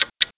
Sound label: ticking